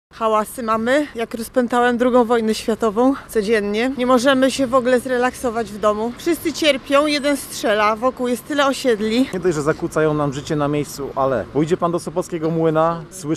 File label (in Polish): strzelnica; wystrzaly halas z gdynskiej strzelnicy przeszkadza mieszkancom sopotu